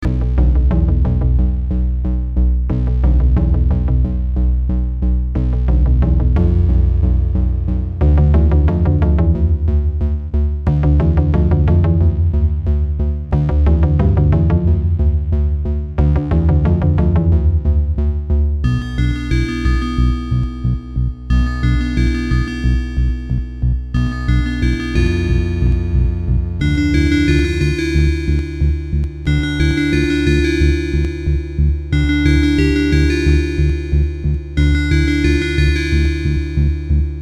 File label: music ingame; agent final